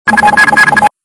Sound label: Ringtone